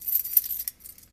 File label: Keys Rattle Jingle; lock & keys